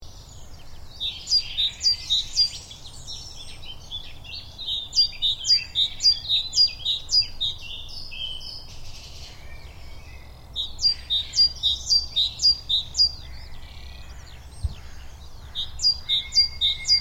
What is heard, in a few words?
Great tit; Everdon Stubbs